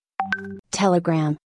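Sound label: Telegram notification; Telegramnotificatin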